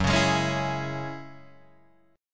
F sharp 7th Suspended 2nd F sharp down Guitar DADGAD